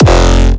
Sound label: Powerstomp Kick F